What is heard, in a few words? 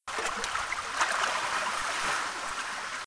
Water Laps Loop; Playable Tutorial Level